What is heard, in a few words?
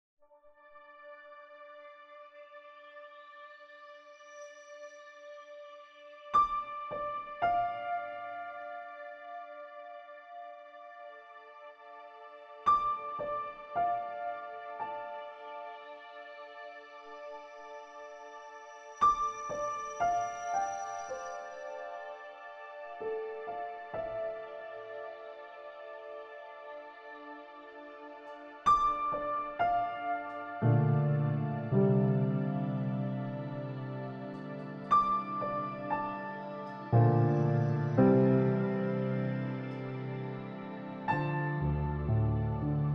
SAD PIANO